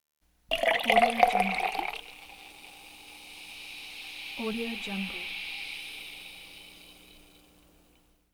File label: champagne